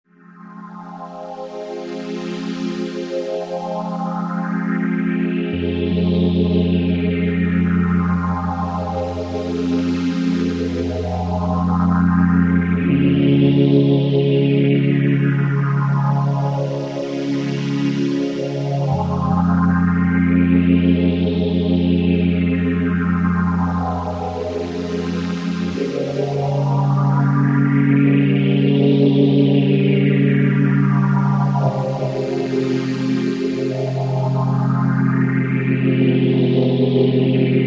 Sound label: Eminent 310